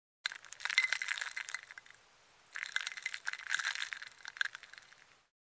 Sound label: Stiring drink with ice cubes